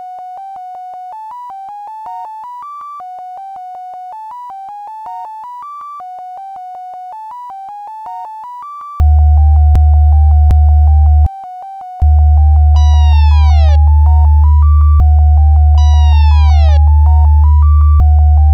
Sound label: Sine wave